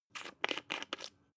glass pepper cap unscrew; berufe; gastronomie; soundpool

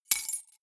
key ring large drop stone soft

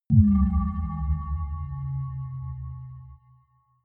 sci fi bell dull

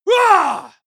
male combat attack short; schreie; menschen

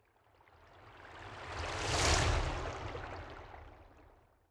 whoosh slow

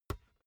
basketball catch finger tips

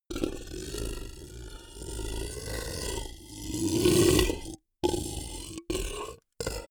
beast grunt warning angry; kreaturen; comedy-cartoon; soundpool

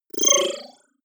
disappear flicker; soundpool

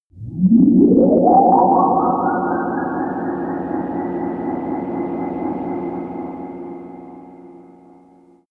beam

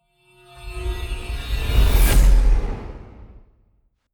sci-fi vehicle metallic departure; raumschiff

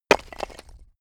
stone hit shatter; natur-tiere; steine

